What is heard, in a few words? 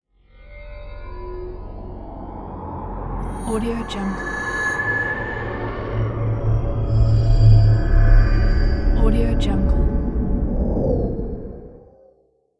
stellar logo